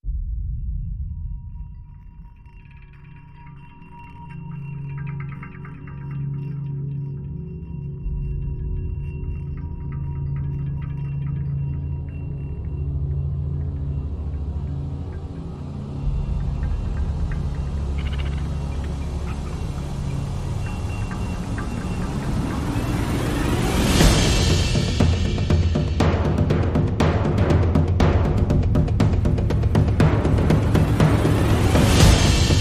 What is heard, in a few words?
Percussion & FX Mix